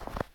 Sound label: snow, footsteps